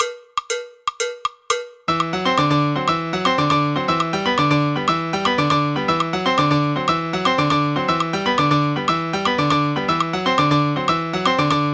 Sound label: tumbao fast